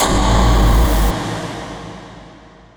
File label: TECHNO FX 140